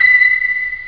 contact wall

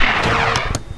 MISSILE; K TANK; KillerTank

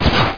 Shield

